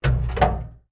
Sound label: turret klank, tanks